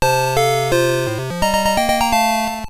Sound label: Success Catch; P3D-Legacy